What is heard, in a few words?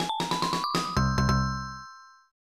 Unused Jingle; Poko Nyan; SNES